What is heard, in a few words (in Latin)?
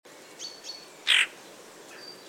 Pica pica; SETE